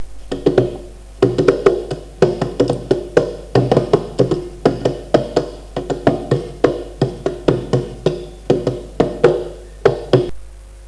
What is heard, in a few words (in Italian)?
bongo